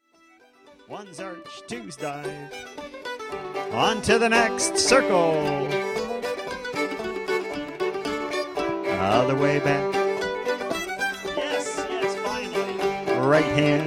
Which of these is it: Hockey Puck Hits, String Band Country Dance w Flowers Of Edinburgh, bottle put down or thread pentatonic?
String Band Country Dance w Flowers Of Edinburgh